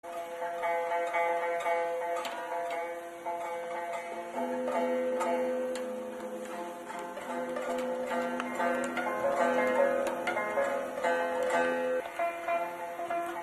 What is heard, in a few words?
selane